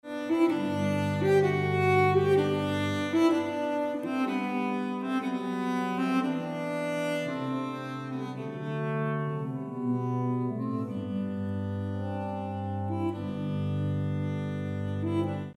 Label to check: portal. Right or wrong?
Wrong